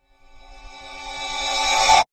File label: Conspiracy Frequency Sweep